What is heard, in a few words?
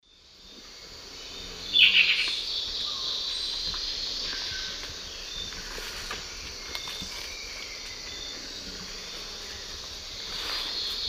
Cercomacra serva, call; S. America; Eastern Lowlands